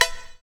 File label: SYN SPACE, dance synth; Sound & Vision - Gigapack I CD 2 (Roland)